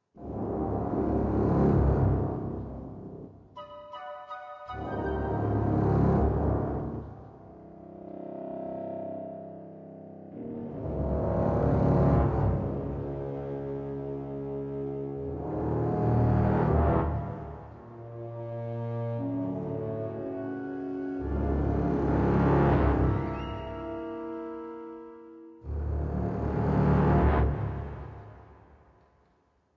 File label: Suspence